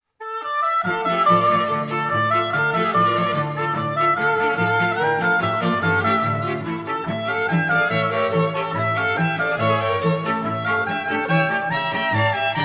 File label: Polka